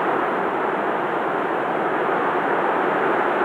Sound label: close ground far